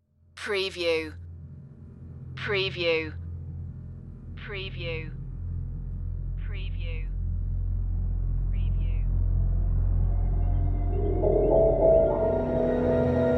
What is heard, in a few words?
big hollywood dramatic score